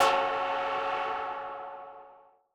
M Dynamic Tech F#3; KG MegaSaur

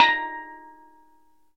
STEEL DRUM

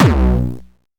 Jump kick, Jump hardstyle kick

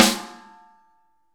SN, Real Mega Drums

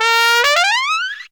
TRUMPET FX; Zero-G; Phantom Horns

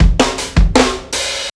ROOMY FILL, breaks, drums; Basement drums vol 2

